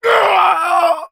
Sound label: death